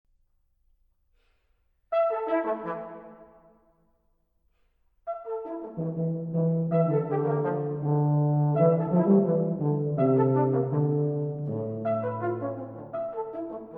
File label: Sephardic Quintet